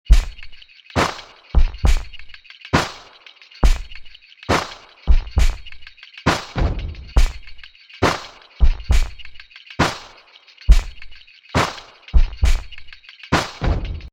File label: sinewave beat